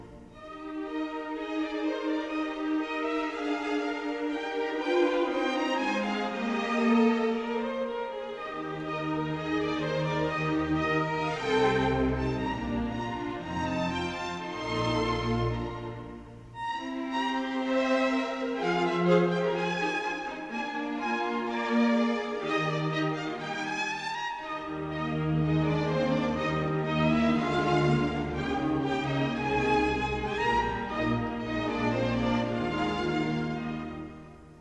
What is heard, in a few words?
Easy Sightreading, violin excerpts